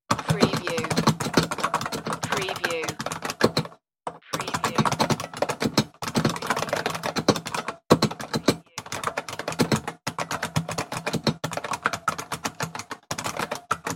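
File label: Old Keyboard Typing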